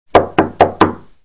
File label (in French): battement toc toc